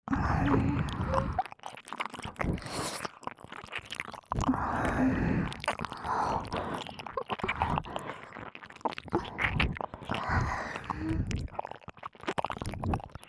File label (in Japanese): 激しめ両耳舐めループ